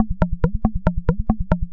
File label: Noise, LFO & Noise